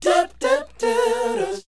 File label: SHOO B C, R&B Dance, vocal; Spectrasonics Vocal Planet